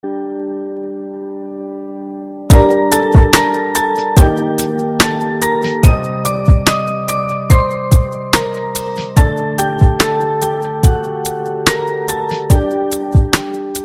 Super Soft Piano

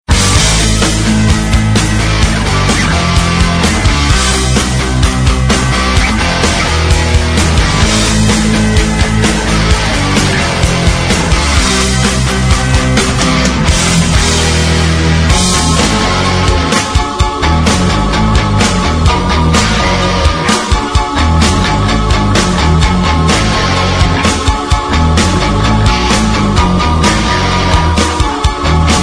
Sinfonia; Sinfonia Fantastica

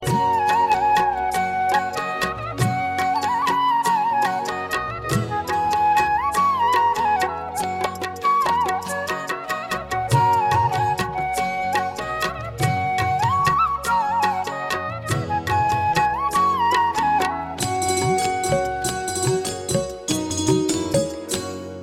Flute

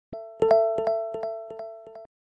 bbm echo

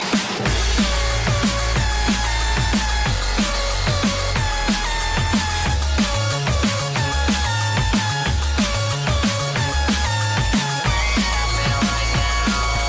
Hard Beat

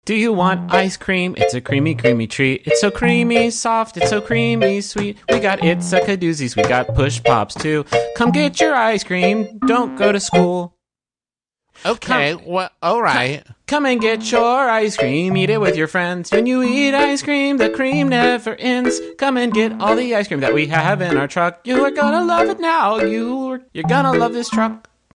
Ice Cream Songs

